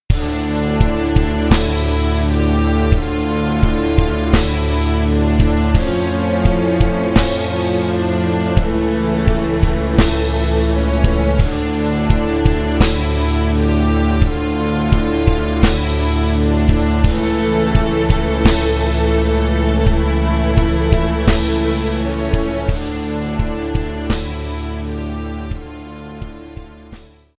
Rock Tracks

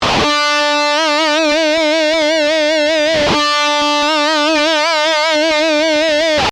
Vibrato Out Of Tune, guitar solo

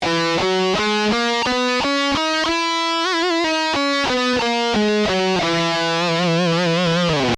E Lydian, Guitar Licks

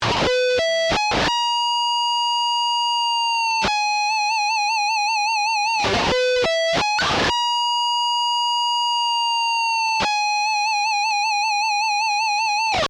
Exotic Guitar Lick

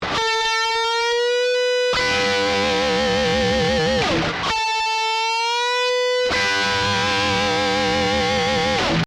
Double Stop

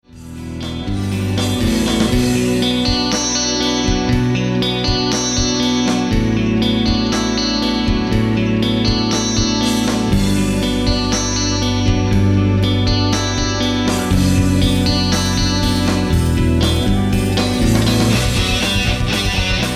Rock Tracks

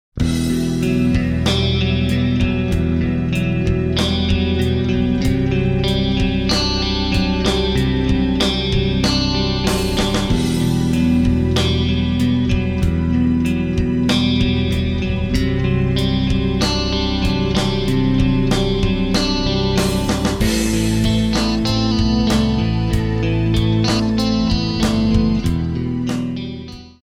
Rock Tracks

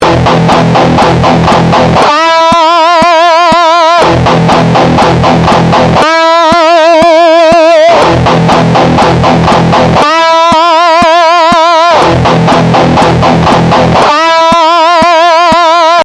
Rhythm And Lead, guitar

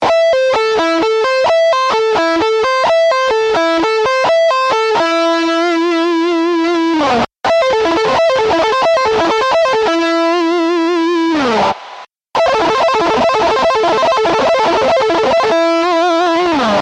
Double Accent

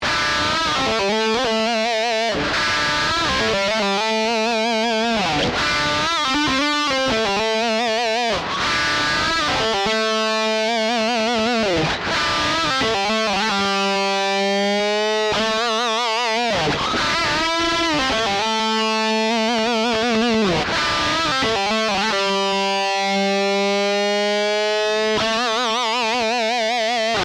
Variations; Blues Licks Ornaments

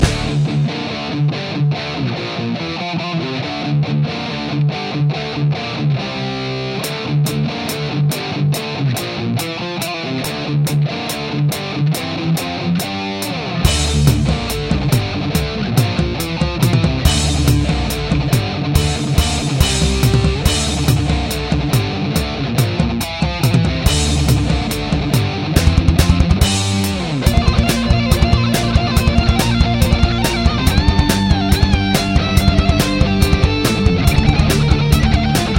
Solo Sample

